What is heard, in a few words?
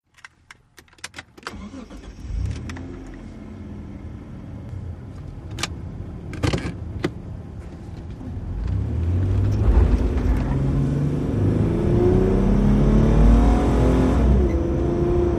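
Montero Steady Fast OB, 1998 mitsubishi montero int.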